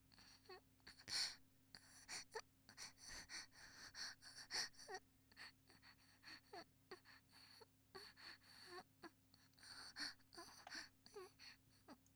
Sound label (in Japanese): んあ; ASMR